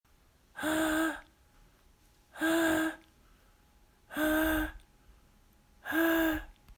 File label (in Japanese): 裏声 仮声帯